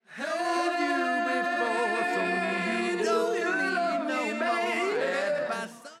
vocals

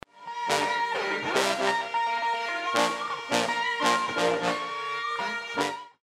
groove trombones

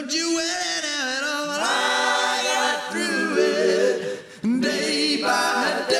HARMONIES

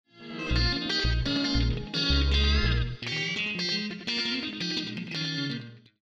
groove funk

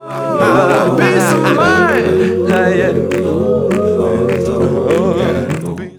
quartet 3rd verse

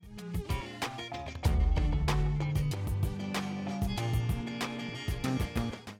original music

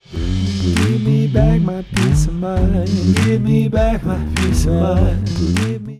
acapella

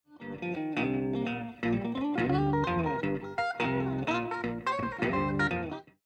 groove fifties

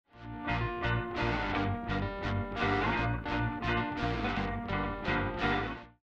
groove everlasting

